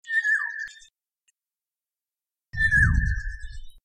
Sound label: oriole call